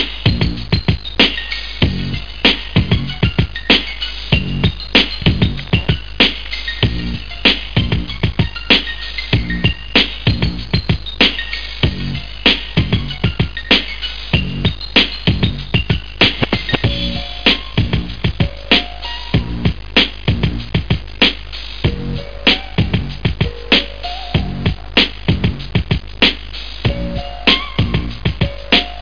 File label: tune; PAEC